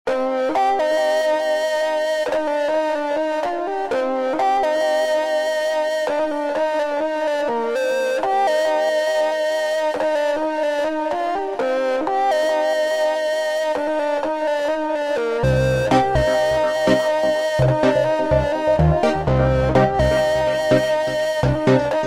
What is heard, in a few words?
SYNTH LEAD